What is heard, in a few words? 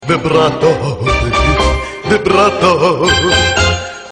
vibrato